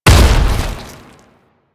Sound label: Tank Infested Step Default; Enemies; Movement; Warframe; Lasrian